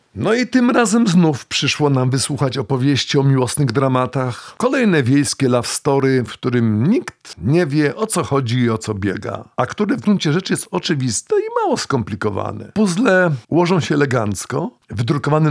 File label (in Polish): polka; siwy golabek; biskup